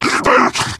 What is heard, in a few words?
charger lurk, voice idle